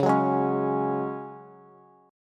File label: Db Suspended 4th Db, Bass; strummed